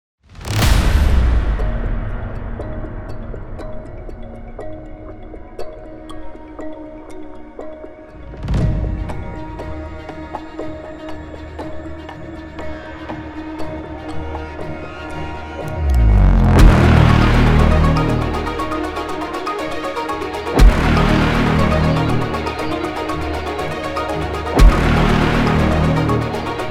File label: Trailer Brass; Musical Sampling